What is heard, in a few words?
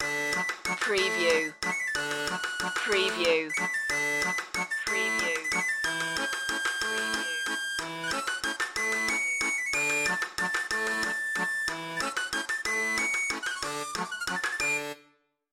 carnaval de paris